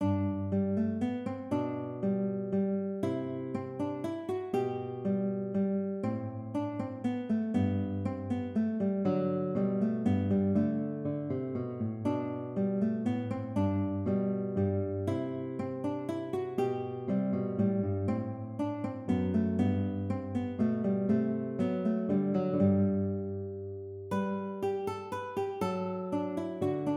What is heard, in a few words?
Menuett, konzertgitarre; Bach